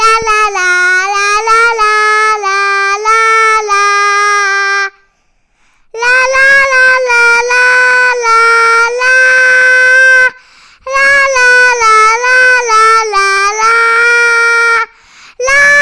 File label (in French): enfant qui chante